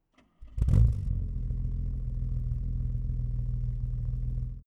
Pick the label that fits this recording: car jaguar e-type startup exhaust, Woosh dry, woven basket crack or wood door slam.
car jaguar e-type startup exhaust